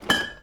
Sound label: BANG, explosions gunshots